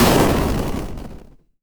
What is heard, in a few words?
retro game explosion